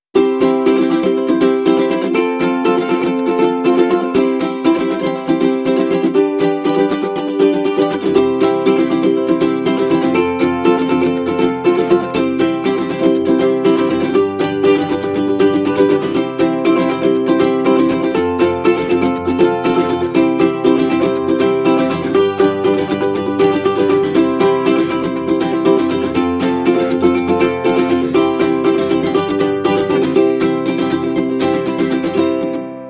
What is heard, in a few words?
Ukulele and Guitar Mix